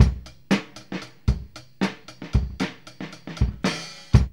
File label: GALLOP 116, Funky Elements loops; Zero-G